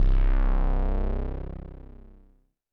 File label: Roland TD-20 Percussion